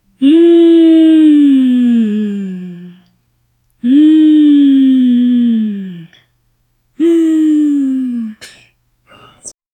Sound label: a cheerful woman happily, happy